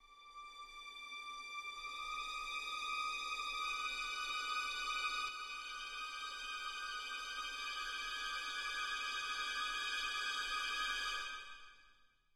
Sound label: Jungle Strings; Far Cry